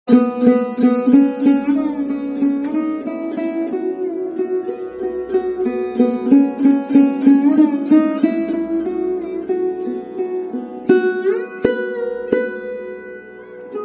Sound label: Monsoon Romantic Bgm